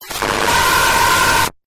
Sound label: Jumpscare